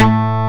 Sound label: DIGITAL BASS, BassGuitar